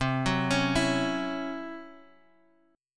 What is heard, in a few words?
C sharp Minor Major 7th Double Flat 5th C